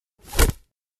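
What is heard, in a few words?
Hit Target; Toy Story Mania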